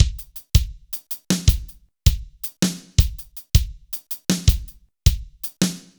BPM 80 AMB

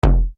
KNOCK KICK, Yamaha RY-30

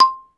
XYLO; Roland - LA Composer vol. 1

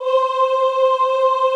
OOH C4, Classical Choir; Best Service; Extended Classical Choir

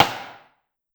Rim, Clavia DDrum AT, Pitched Down

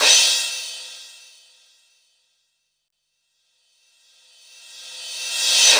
CRASH, DRUMS; Analogon

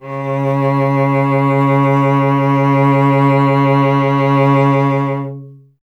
STR cello C#, Cello

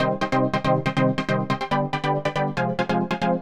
Arp 140bpm C, Trance

